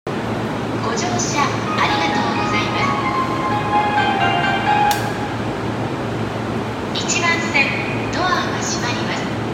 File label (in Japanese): 京葉線越中島東, train sounds